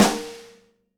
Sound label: SNARE RING, Live Snares; PlatinumPhattCD1; E-MU Producer Series Vol. 8 – Platinum Phatt